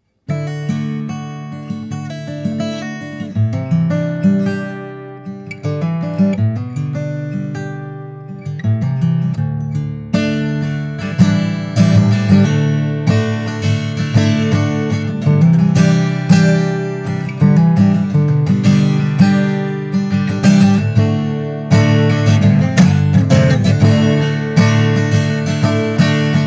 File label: Guitar